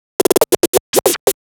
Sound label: Scratch Fills 140BPM